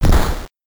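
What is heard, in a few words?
explosion 8bit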